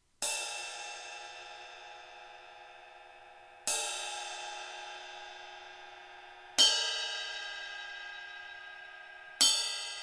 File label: Ride 21; ride bekken; Dervish; Amedia